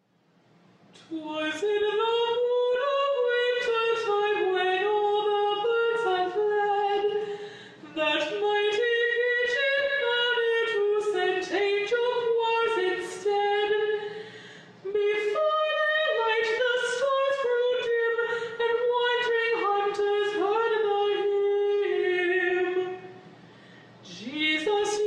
[F] [singing][acapella][three Sirens singing][kulning][Canadian carol]; Christmas; mellow; The Huron Carol